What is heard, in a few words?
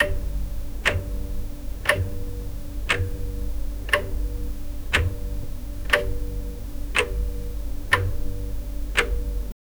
medieval mechanical clock